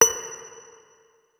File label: notes, guitar; Hideout Furniture